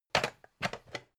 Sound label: pan stack